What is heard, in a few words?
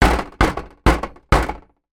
impacts metal corrugated iron panel x4; zapsplat